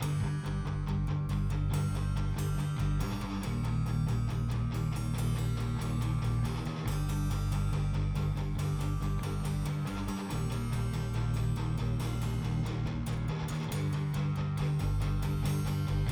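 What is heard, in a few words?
Combat Threat 140 G minor 4/4; Combat Prologue2 Threat2 140 Gm 44 3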